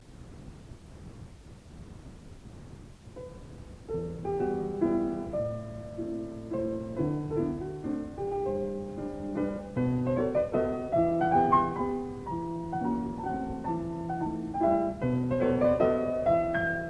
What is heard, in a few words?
Mazurka; Chopin